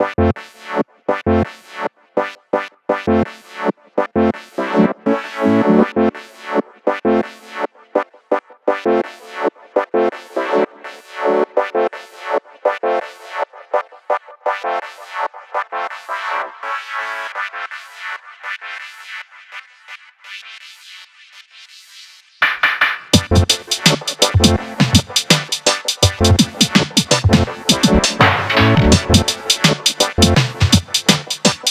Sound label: casiochord; weeklybeats-2016